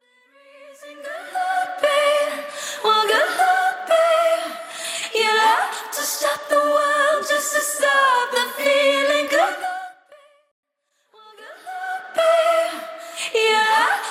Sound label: Studio Acapella